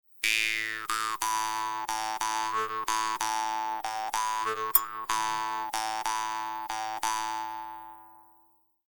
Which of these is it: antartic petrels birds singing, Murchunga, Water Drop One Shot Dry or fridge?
Murchunga